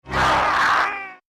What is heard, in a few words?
Mutant termites soldier roar